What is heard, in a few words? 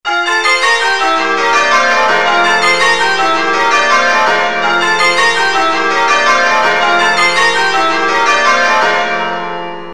Whittingtons, changeringing